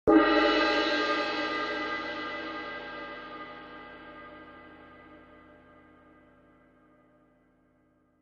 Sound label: K Constantinople Suspended, cymbal